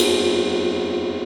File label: Wind Gong Cymbal one shot, Ambient Metals; Sonic Foundry (Sony Creative Software) - Ambient Atmospheres & Rhythms